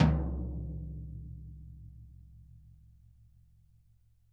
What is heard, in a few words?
VINT KITS; Sonic Reality - Vintage Timetraveler